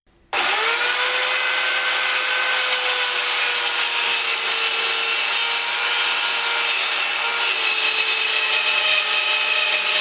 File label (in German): kreissaege